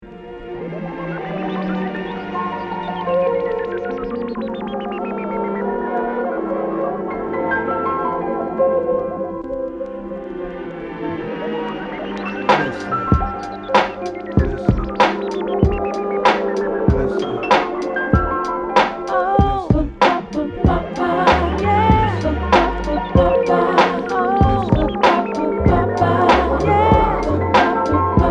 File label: funky